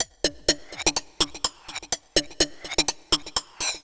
XTRA HOUSE 125

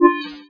signal; NetStumbler

